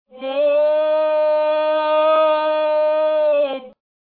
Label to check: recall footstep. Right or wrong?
Wrong